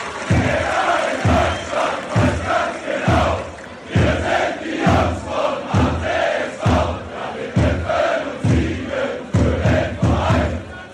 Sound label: jeder in deutschland weiss ganz genau fan chants